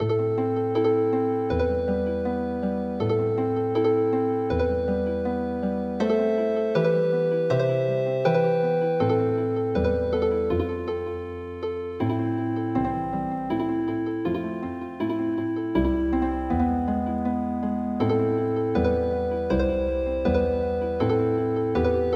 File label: Healing Harp, midi